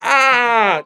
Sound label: "ah"